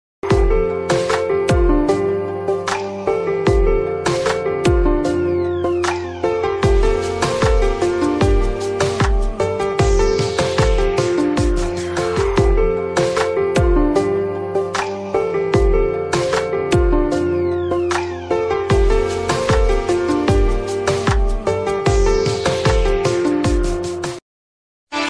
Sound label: romantic ringtone